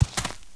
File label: Red Condor walk